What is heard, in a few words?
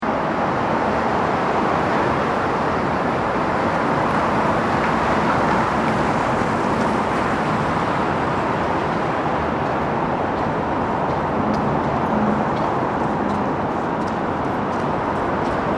Traffic Tunnel Traffic, Busy traffic center in Stockholm, Sweden; Slussen; Recording from inside a car tunnel. Flat sounding medium hea; Traffic And Car Sound Effects